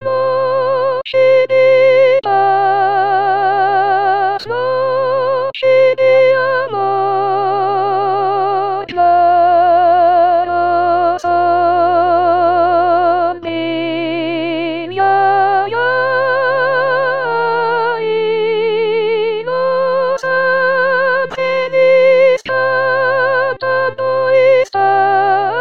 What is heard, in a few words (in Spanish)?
nochedepaz T voz, con voz; Coro "Con dos Bemoles"